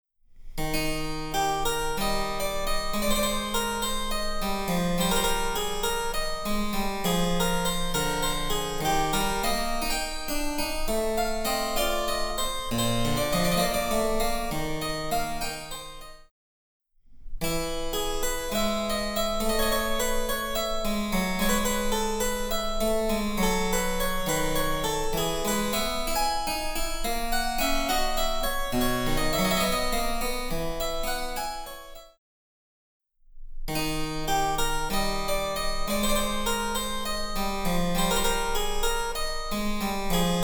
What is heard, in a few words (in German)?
Cembalo; Mark Lindley WTC 1